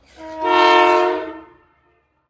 loco horn

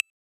click, Mobile

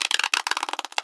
creak, fishing

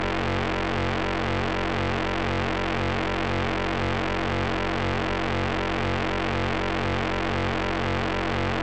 beam, Weapons

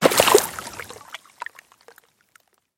water out

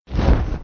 parachute open

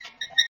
wheel

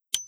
drop

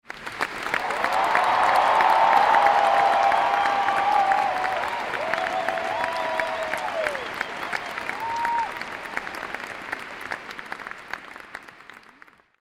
legendary, Fishing

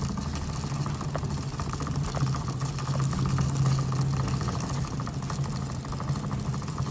cart

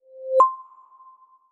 duty

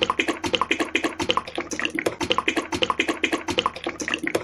dispense fuel

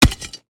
pickaxe hit

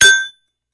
hit

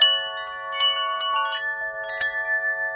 magic bell

